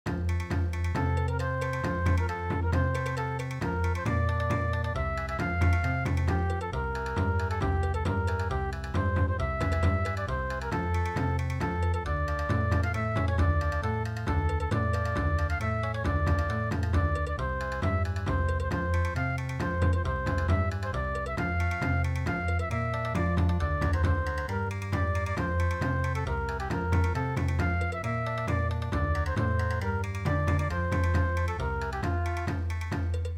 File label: Bon Odori, midi